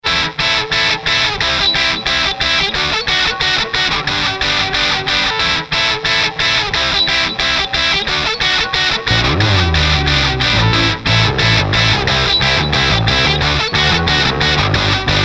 rock band

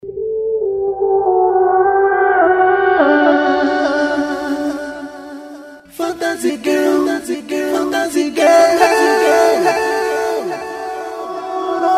fantasy girl acapella

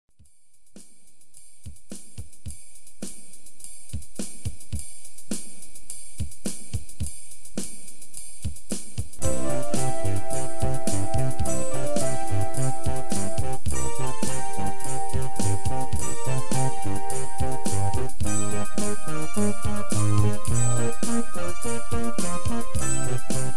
instrumental

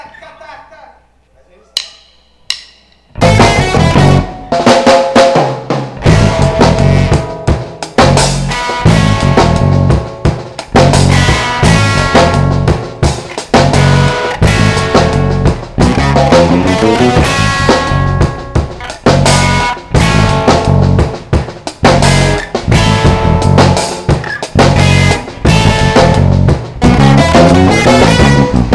raggadub

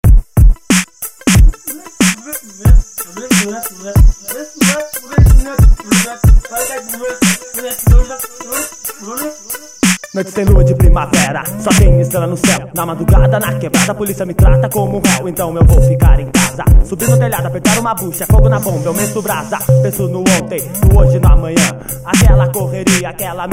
hardhiphop outro

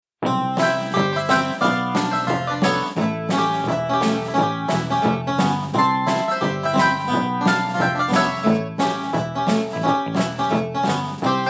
happy country

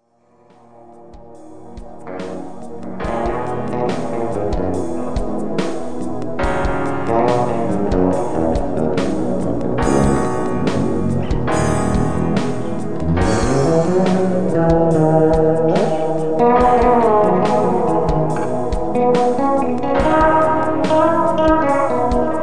bass solo

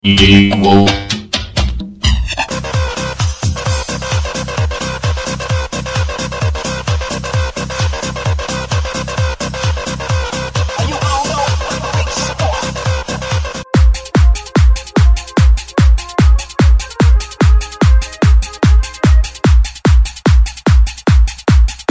dj robot

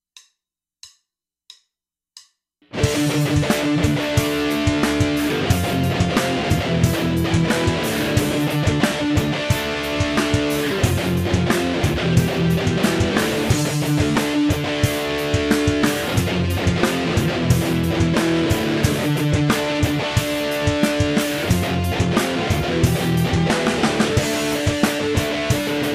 drive guitar

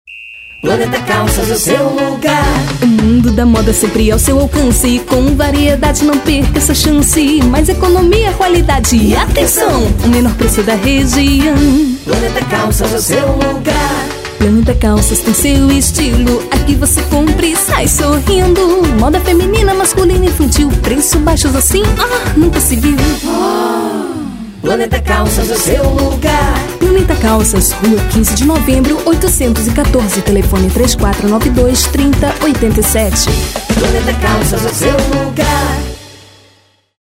jingle; planeta calcas

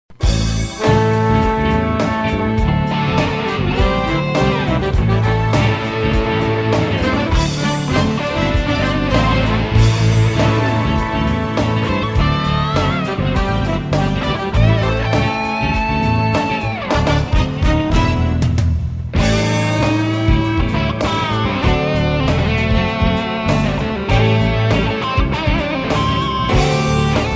guitar happy balance; orla

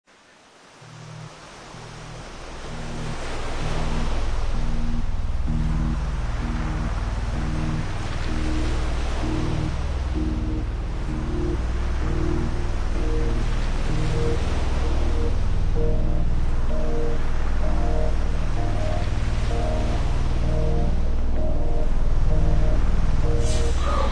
dark waves ambient experience

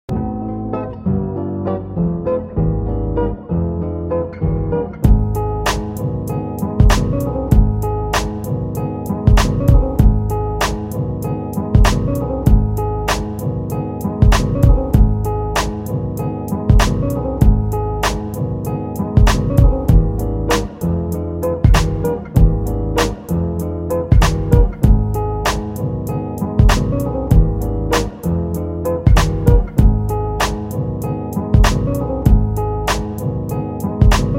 inst

